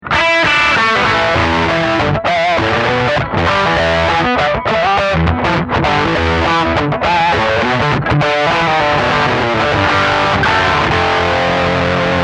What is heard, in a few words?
Lead